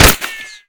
impact dirt; Modern Warfare

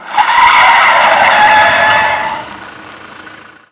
car skid; favela; Call of Duty Modern Warfare

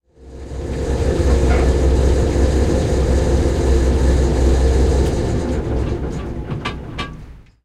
hetzer engine int stop, tanks; War Thunder

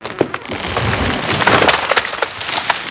treefall creak; World of Tanks Blitz

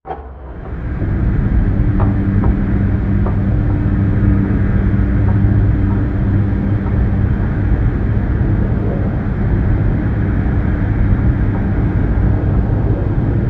ship engines; World Of Warships

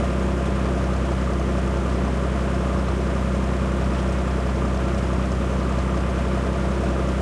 engines; World of Tanks

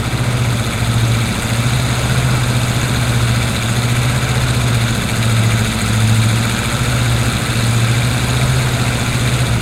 m24 engine rpm 66 load, tanks; War Thunder

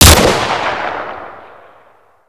ak47

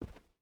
footstep rubber boots cloth run; Rust

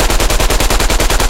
loop maxim origin; guns tanks; War Thunder

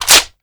mp5 reload clip in; foley; Call of Duty Modern Warfare

